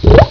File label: SNORTER; ANIMALS